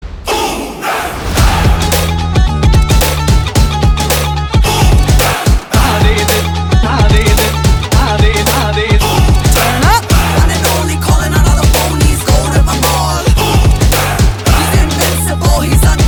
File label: title track instrumental ringtone